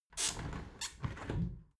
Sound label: window open soft sequence